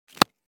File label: Ice hockey puck impact hit